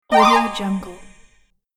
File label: spell of the magician